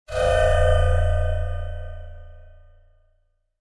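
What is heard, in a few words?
Electric Rattle Reverb, multimedia element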